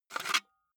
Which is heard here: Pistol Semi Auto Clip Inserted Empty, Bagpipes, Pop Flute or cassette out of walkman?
cassette out of walkman